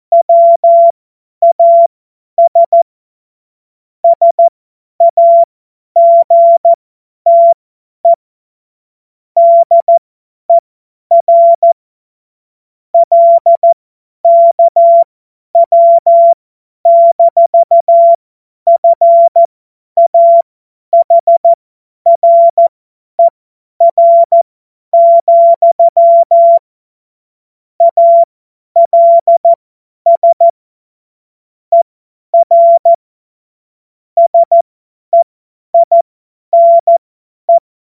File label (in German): Witz, Morsekurs, 25 F70; Witze